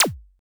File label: RAD ZAP, TECHNO; Drums In Your FACE!